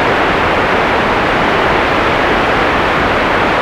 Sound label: noise